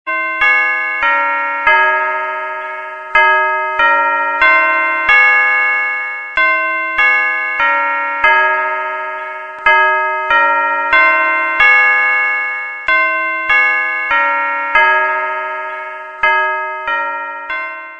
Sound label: big ben